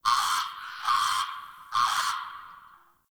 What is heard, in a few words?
Tree Critters Scared; Warframe; Duviri; Ambience